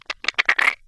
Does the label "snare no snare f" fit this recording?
No